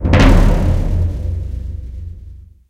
intro guild

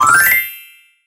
level up result

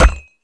click mission

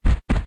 walk

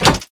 click special

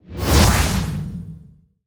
event board space travel

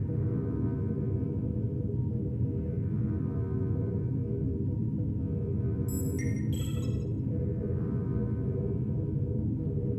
ambi sp

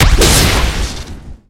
click

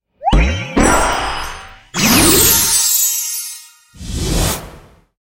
gacha ball

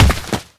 hit

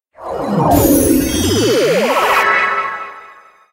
level up effect

